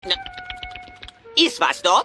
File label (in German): hase effekt